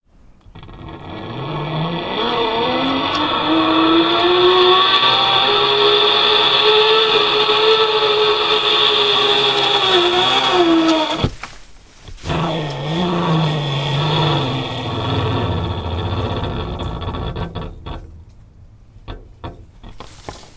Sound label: zipline run from bottom